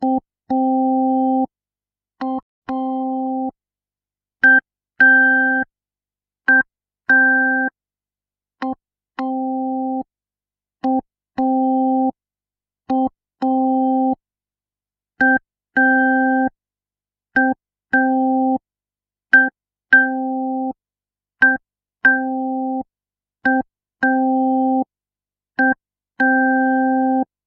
Hammond SK1 Percussion Varianten

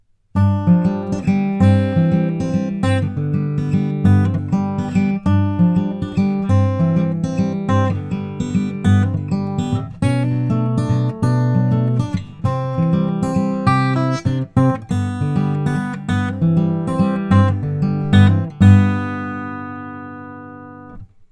SC 440 USB Podcast Westerngitarre Picking; t.bone

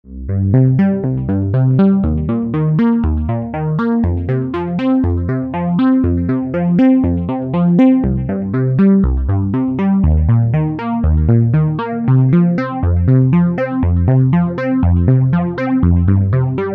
Dual Phase Sequenz synchronisiert; Behringer; via Maths

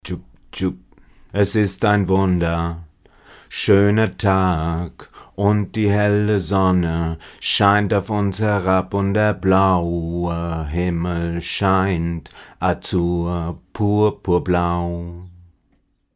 vocals clean dry Niere; Millennia HV35; Orpheus

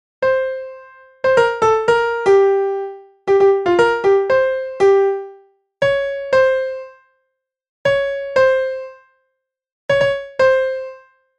Melodie

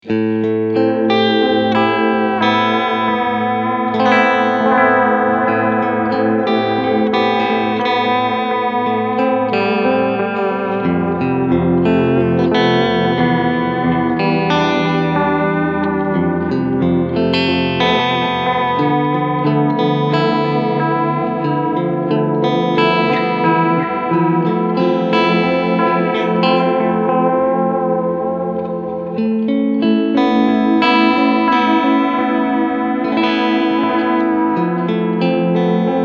med delay 16tel MOD

